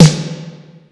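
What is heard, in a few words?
Snare, Yamaha SY22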